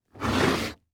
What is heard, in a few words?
Foley Footstep Golf Cleats Slow Scrape On Solid Wood Platform Short; Foley Footsteps